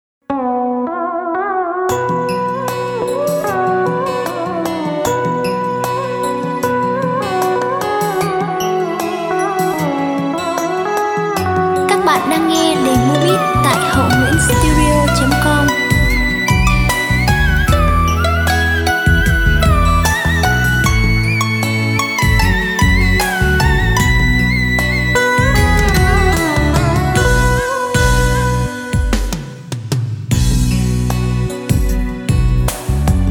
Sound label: beat, playback